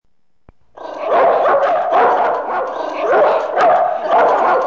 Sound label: dogs